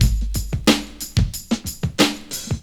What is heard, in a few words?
break, breakbeats